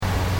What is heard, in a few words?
Sci fi machine loop, Scifi Machine: Loop, Short; Control Panels; Stuart Duffield